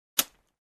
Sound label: Switch clicking sound